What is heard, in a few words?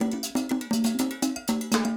Perc mix, acoustic percussion loop; Drums & Percussion; Percussion Loops